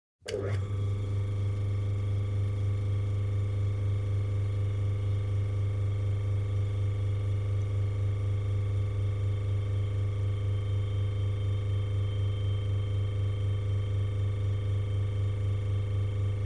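vkl rabota i vykl holod, fridge switch on, running and switch off; шум работающего холодильника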